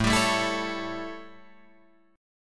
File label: A 7th A, Guitar Drop D